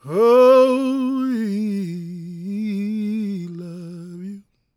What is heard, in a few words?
GOSPEL MALE; Spectrasonics Vocal Planet